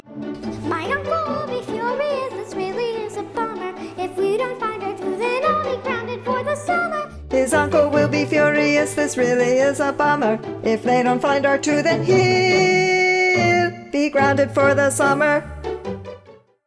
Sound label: tenor, auditions; Hear bars 46-56 with just the tenor part sung; 2019 Space Opera